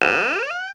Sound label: Door Squeak, Yamaha DD-35